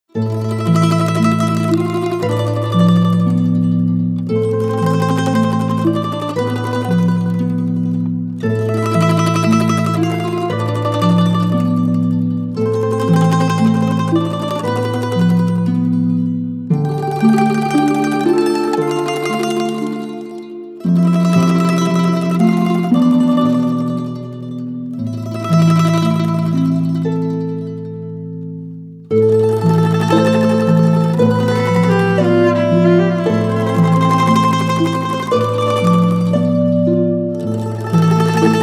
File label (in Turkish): Mandolin Versiyon; Kuzgun; Kuzgun Dizi Muzikleri